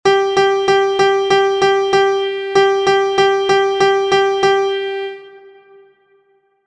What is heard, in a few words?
MIDI